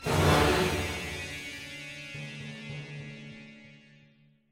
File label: Music Stingers Stinger; Hunted: The Demon's Forge; x360